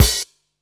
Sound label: hat, Techno 138 BPM